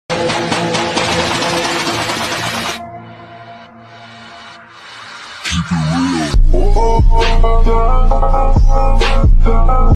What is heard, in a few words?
hindi ringtone mood attitude alon ringtone